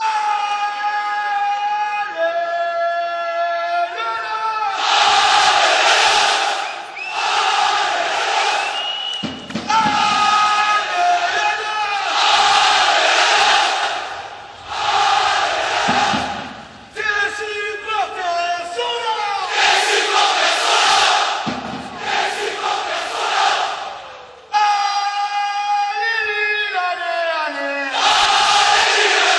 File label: shouting lille chant